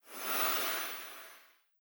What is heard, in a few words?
wind spirit